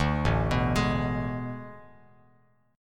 A Major 7th Suspended 2nd Suspended 4th, Bass Standard; AM7sus2sus4 arpeggiated; 10,0,9,13; JGuitar